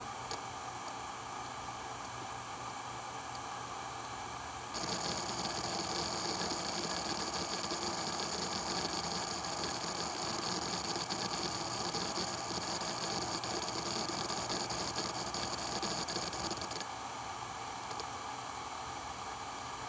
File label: Stress, WD HGST HUS726T4TAL4204; HDD